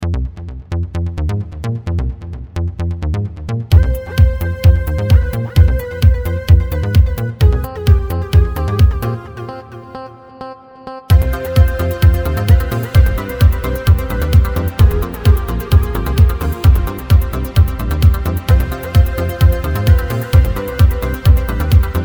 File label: Bass Power; DecaDance